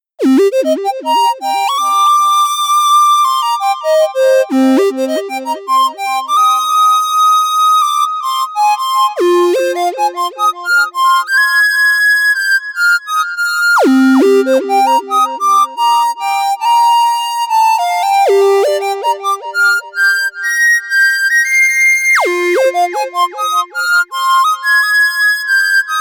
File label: Sequential Pro 3 Soft Lead